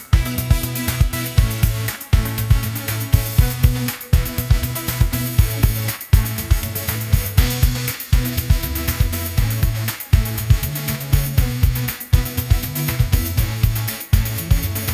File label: deskphone pop groove